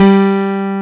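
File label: acoustic piano G3; Greytsounds; Scott Peers Sound Engineering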